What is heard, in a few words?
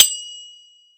percussion bell